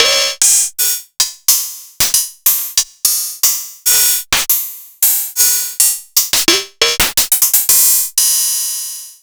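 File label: ms20 processed cymbals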